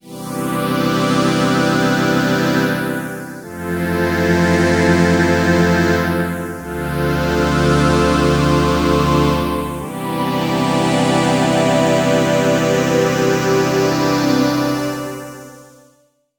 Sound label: Sizzling Strings; TEO-5